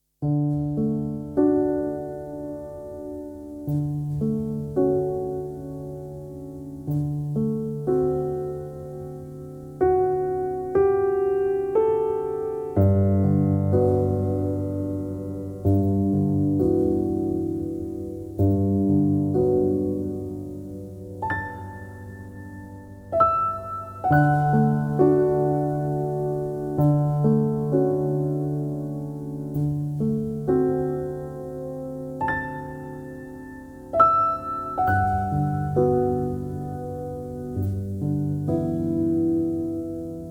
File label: Nostalgia, 감성